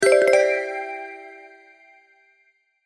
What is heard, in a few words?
success